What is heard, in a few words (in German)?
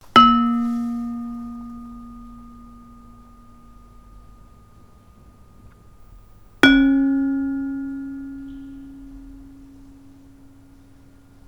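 Saron gross mit Holzhammer, gamelan; Indonesien; HB-Samples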